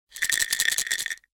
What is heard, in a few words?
pill bottle